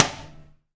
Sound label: Dasani Kit, ethnic and world percussion